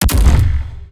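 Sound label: black shield, impact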